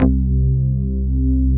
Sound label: DOP PLUCKS; Pro-Rec - Synthsonic; JV ANALOG2